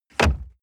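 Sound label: sports car interior glove compartment close medium; verkehr-transport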